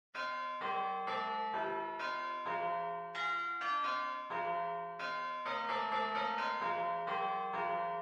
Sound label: Classic iphone IOS Ringtone Original